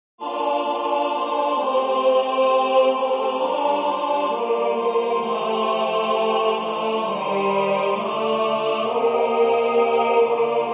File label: tenor